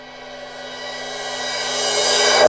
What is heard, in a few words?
CYMREV